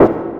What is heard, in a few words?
step, sfx; rust